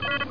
Default Beep; Chasm Theme

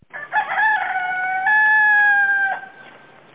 rooster; Cassandra

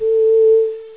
Flute A; RenderOrgan

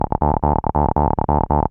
Acid B3, Techno Harmony; Techno Music Maker

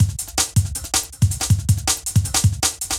DRUMLOOP D+B 160; eJay

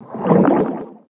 swim under; hereticiidemo; player

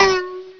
SPRING, Crashes; The Complete Web Studio 2.0

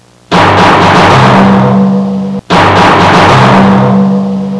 big gong; Sound Library 2000; Wayzata Technology; Music In

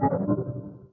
menu out; Alpha Centauri

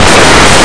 box

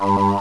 Power Up; astrorally

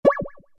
hollow push

